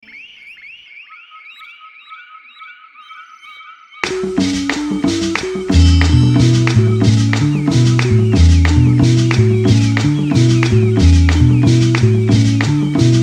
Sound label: Pygmy Instrumental